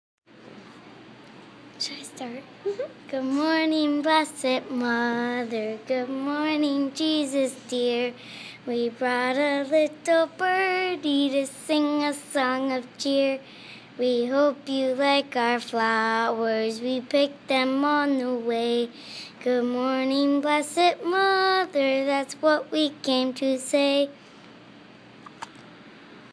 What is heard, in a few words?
good morning blessed mother